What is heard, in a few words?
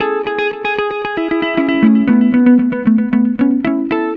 guitar, stringed instruments